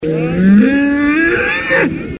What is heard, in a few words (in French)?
taureau cri